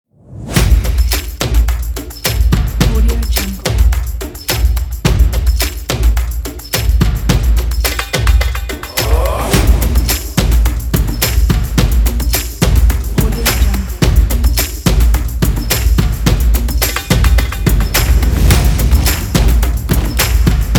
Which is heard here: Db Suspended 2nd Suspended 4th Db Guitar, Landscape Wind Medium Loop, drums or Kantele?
drums